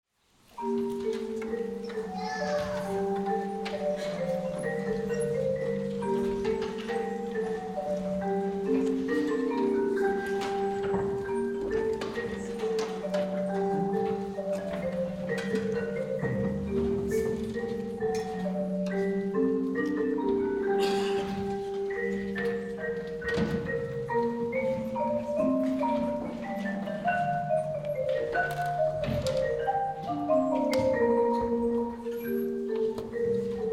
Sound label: Minuet